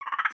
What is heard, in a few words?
Pets Skunk Idle Short; creatures pets; Lego